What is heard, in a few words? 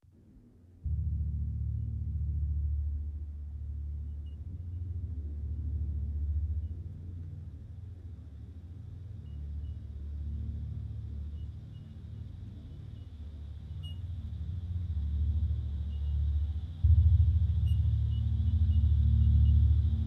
dub